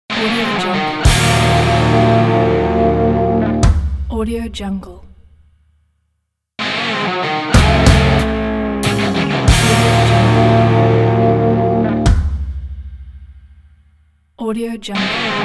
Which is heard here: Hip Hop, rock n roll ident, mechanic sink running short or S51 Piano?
rock n roll ident